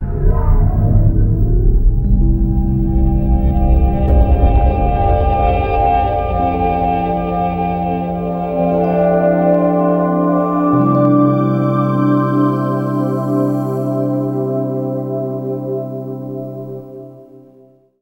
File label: Ambient clay